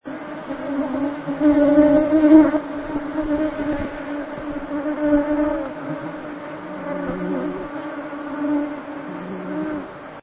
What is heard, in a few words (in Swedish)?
dag getingar; borneo